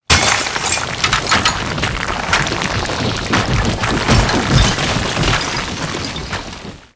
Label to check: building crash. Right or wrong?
Right